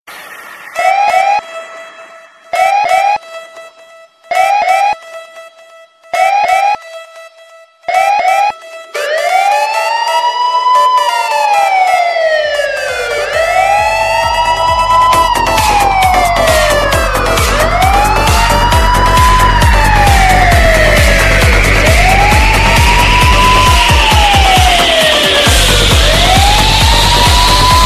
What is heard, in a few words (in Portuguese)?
toque sirene de policia dance remix; toques para celular